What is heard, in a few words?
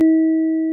fork virtual